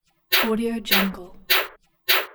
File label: air pump